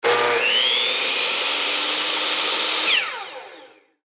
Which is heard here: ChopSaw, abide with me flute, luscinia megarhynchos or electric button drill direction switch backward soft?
ChopSaw